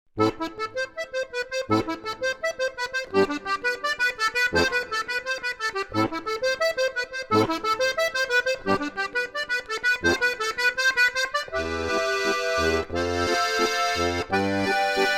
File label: Armonica Stravaganza